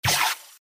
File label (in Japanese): 水ロケットの発射音＿弱 01, おもちゃ; G｜音を出すもの